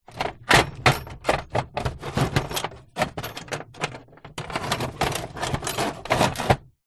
industrial metal tools in metal tool box movements rummage; zapsplat